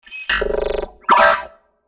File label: Distorted glitch quick burst